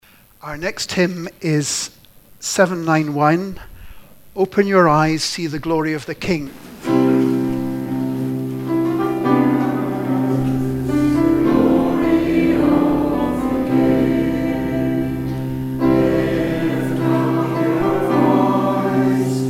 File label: hymn; Hymn 791 Open your eyes; St. Mungo's